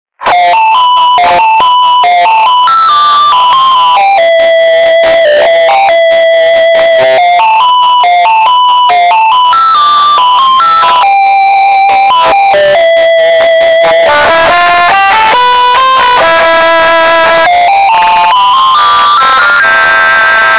bass boost; Lostwoods

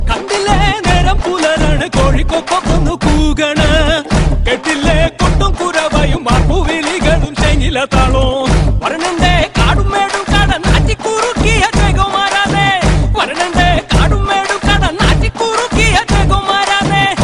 Thick Rap

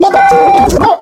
Damage sound

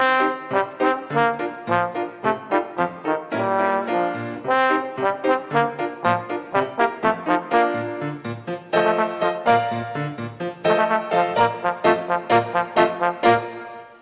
Ruby Trombone

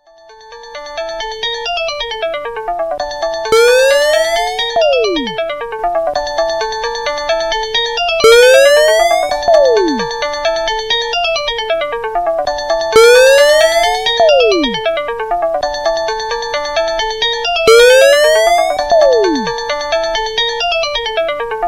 CLOCK ALARM; S5 Mini

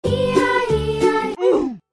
E I E I OOF

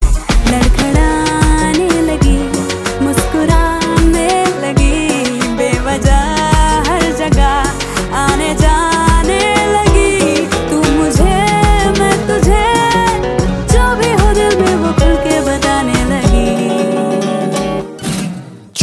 Female

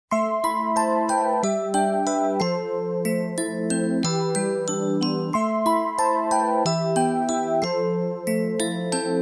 water drop

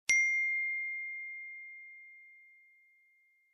IPhone X ringtone

